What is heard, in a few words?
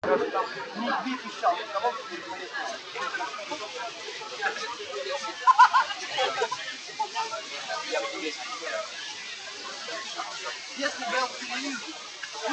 babble, BUBBLE NOISE